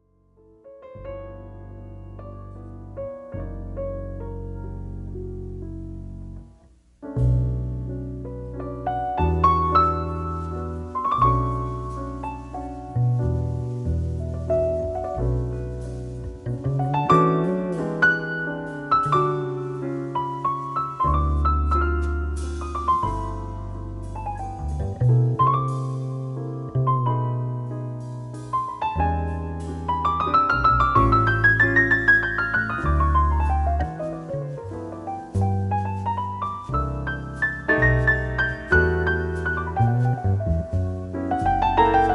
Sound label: B MINOR WALTZ, TRIO